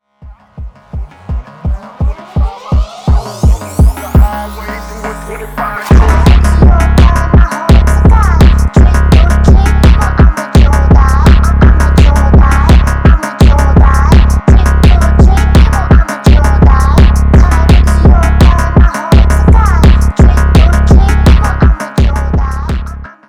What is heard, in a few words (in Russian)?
фонк